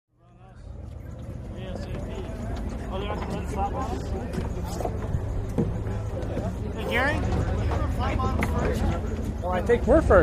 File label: Boat Race Pit Doc Amb, motorboats